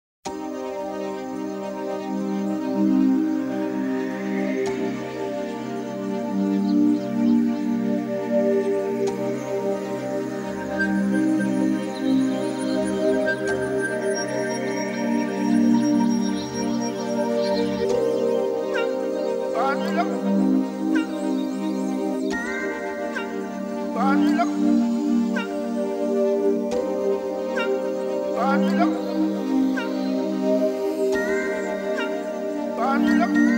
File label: ambient mix